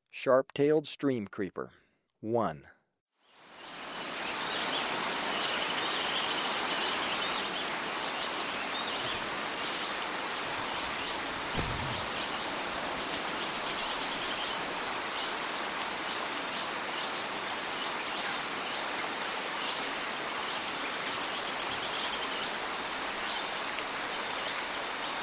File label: Sharp-tailed Streamcreeper, Birds of Ecuador; S. America